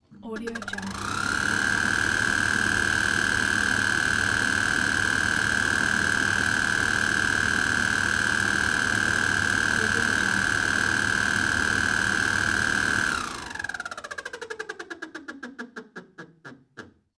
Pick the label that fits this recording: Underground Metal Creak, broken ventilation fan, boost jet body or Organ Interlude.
broken ventilation fan